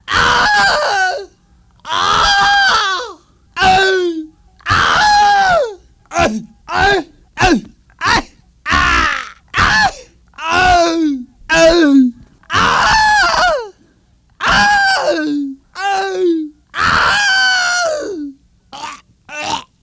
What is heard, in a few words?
Screams Male Various